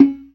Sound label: PERC, ORGAN; Keith Emerson Signature Series